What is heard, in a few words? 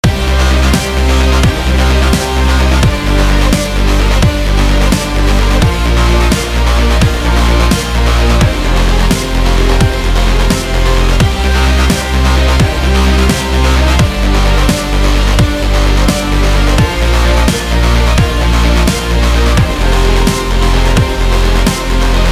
Dubstep; GOT Dubstep 1.03